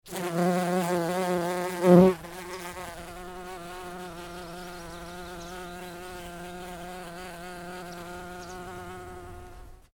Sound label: Bumble Bee Buzzing, Long bumblebee buzz with some bird tweets in backgro; Bee Sound Effects; Long bumblebee buzz with some bird tweets in background